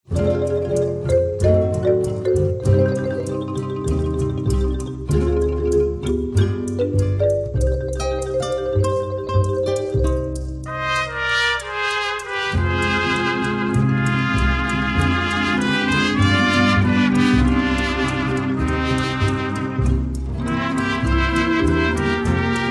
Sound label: Mariachi Street Music